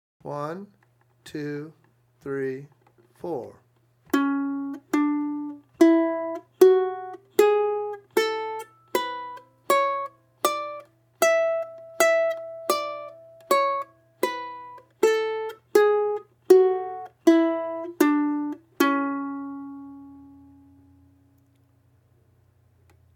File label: A Scale